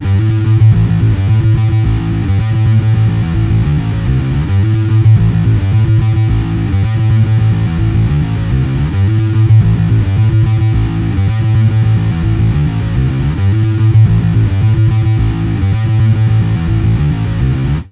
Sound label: Bass Guitar Loops; FM 57 - The Ultimate Sample Collection (1997)